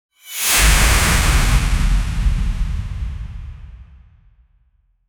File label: overlord abilities activa